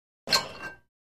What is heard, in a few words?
Free Weights Exerci